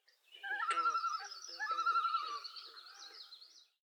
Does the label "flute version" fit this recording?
No